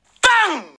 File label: BANG